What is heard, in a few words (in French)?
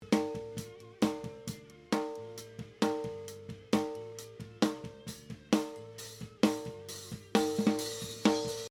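snare, le micro caisse claire dessus d'origine (sans aucun traitement); batterie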